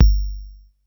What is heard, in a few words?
Bd Lo Bit, Kick Drums; Zero-G; Soundclash